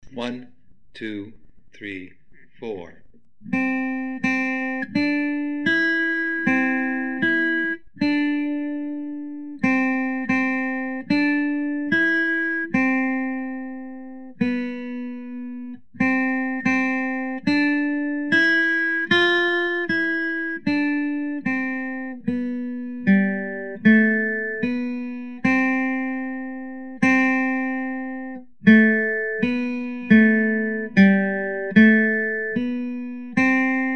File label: Yankee Doodle, gitara